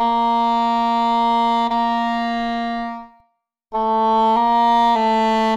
horns; Loops

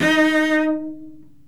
violin; String

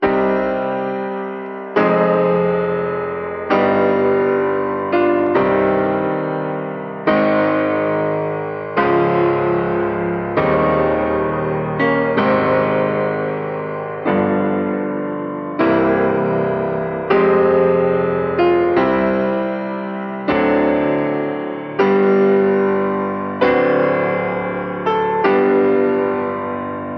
piano, Loops Sad

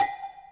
prison bowl, Bells; Misc Percussion

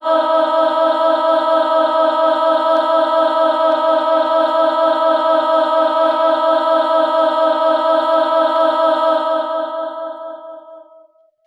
lawawawawaw, Voice

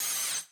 ash, Swish

